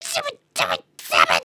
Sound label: collapse; emotes